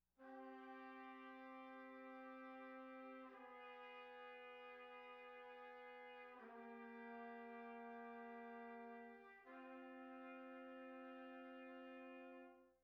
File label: Tenor